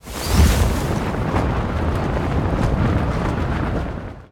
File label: large sail wind